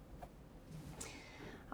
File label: Birds